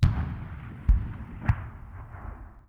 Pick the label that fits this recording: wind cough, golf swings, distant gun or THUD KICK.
distant gun